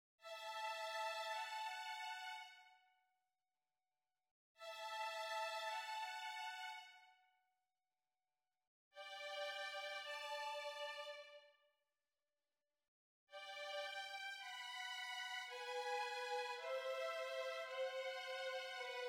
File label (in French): VIOLONS